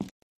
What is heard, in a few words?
walking Solid Slow